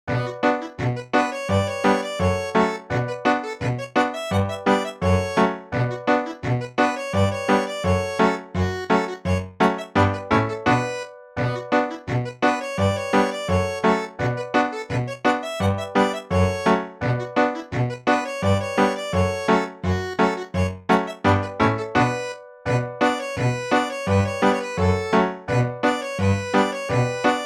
accordeon; ascete

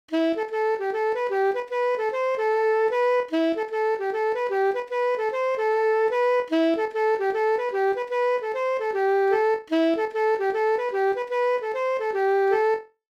gavotte des montagnes

